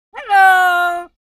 Macaw Vocalizations. A Macaw Says Hello. Close Perspective. Bird